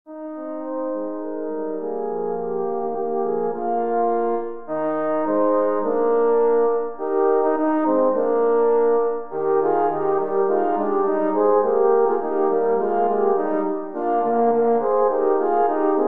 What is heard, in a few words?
horn trio